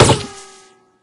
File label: wood, bullet collide